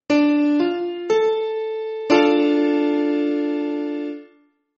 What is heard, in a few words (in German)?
D moll, konzertina; kunstklang